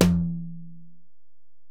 Tom, Alesis DM-PRO

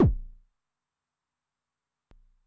BD MOD [Pitch+Depth], 909mod, BASS DRUM

